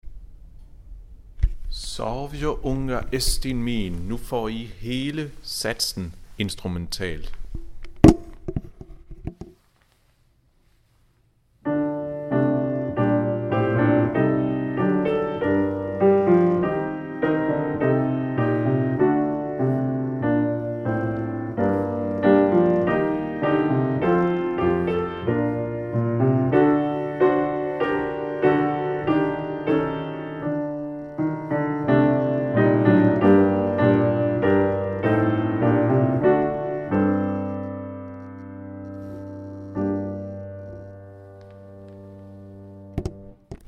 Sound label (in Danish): klaversats; Sov min lille